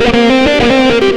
xtra lead

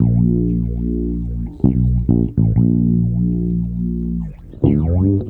Bass Loop, Ambient Grooves; Sonic Foundry (Sony Creative Software)

